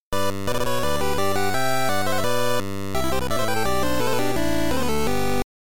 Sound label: 8bit